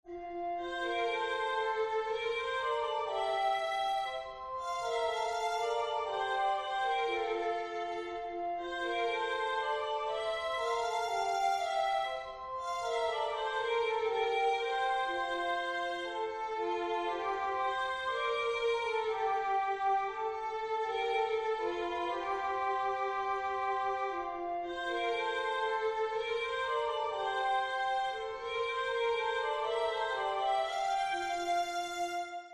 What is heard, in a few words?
instrum; Christ The Lord Is Risen Today